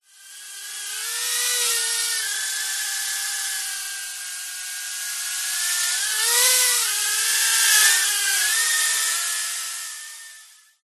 drone mini fly around close; vehicles

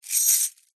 foley keys large bunch prison guard grasp in hand

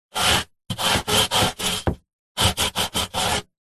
sport surfboard rub wax on; zapsplat

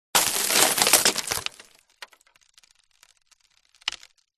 crashes wood; Audio Mangler

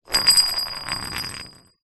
metal bar small roll hard ground; foley

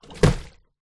plastic tub empty drop water; foley; zapsplat

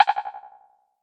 multimedia rollover; sound effects one

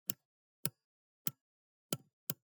Buttons Switches; soundbits

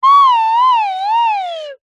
cartoon slide whistle double pitch descend

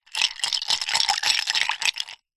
food drink glass soda ice shake short; zapsplat

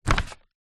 foley paper airplane pick up; zapsplat

